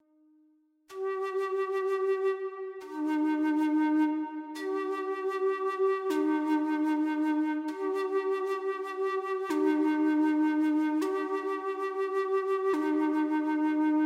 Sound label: native american flute